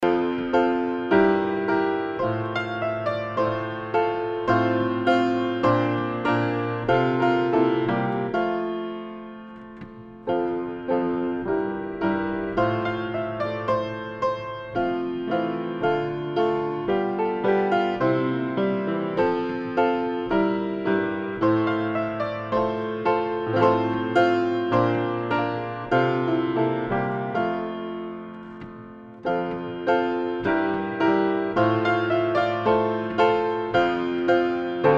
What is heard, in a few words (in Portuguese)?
playback hino